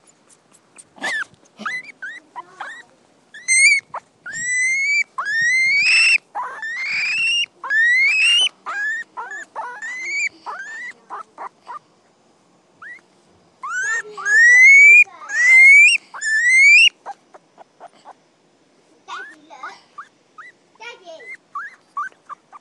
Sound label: guinea pig noises